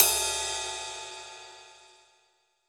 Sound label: Pop Ride, Cymbals; Roland BK-5